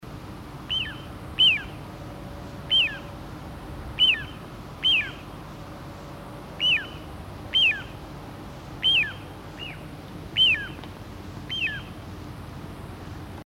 Mindre strandpipare

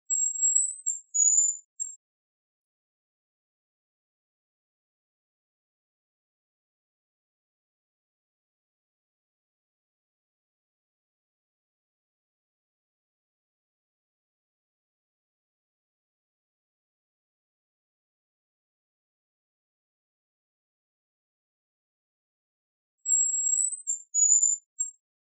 Jarpe

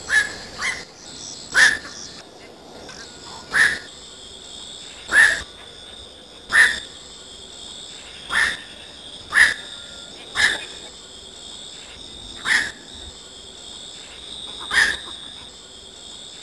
Natthager

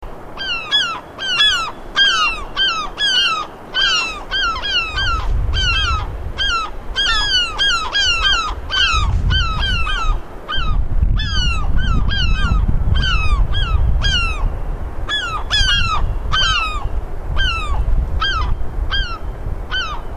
Gråtrut

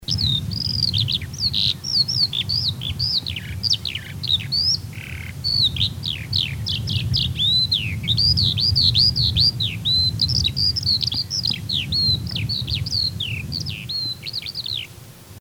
Sanglarka

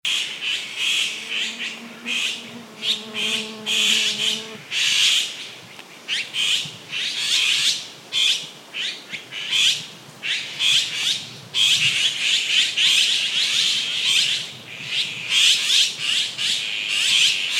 Blaskata; Frank Lambert

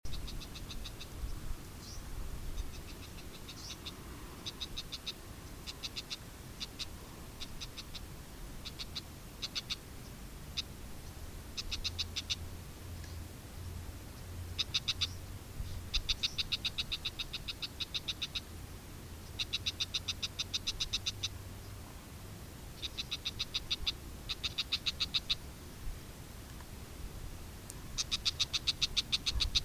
Isabellatornskata

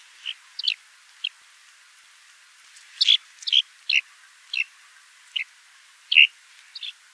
Alpjarnsparv

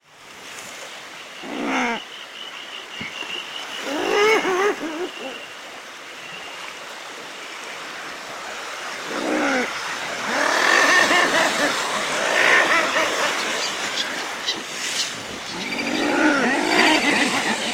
Spetsbergsgrissla

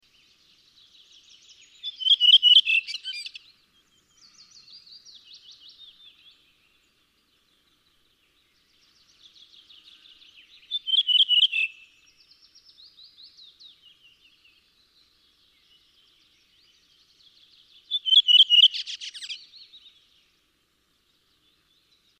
Rodvingetrast

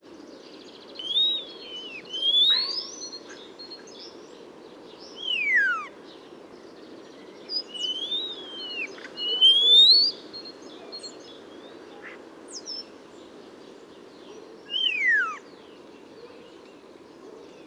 Svartstare song

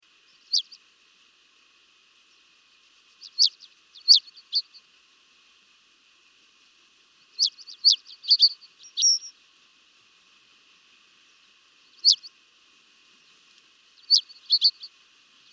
Savsparv

